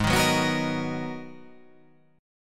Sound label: Ab Minor 9th down; strummed; JGuitar